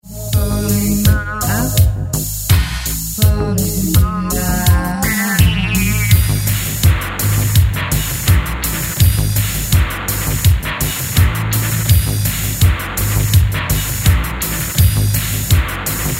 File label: distort